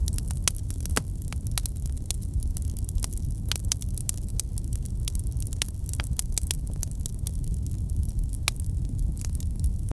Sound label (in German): das beruhigende knistern